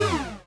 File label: Cancel